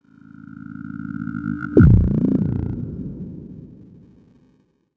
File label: relay signal